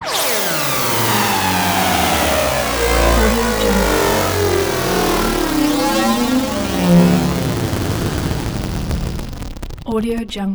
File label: slow power down